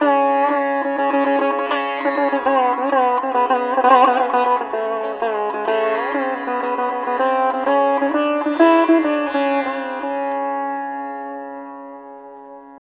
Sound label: SITAR; Spectrasonics; Heart of Asia